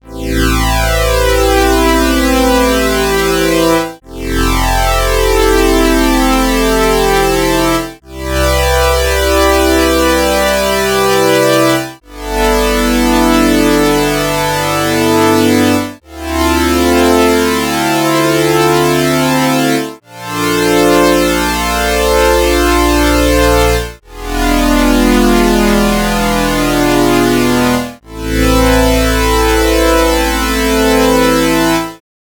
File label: oscillator hd